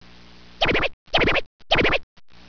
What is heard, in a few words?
rap